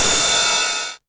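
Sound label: 909 ride tape saturation; Goldbaby Tape808 & Tape909; The Tape 909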